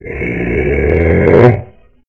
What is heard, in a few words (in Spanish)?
Grito; RPG Maker; Legend of Heroen v1.20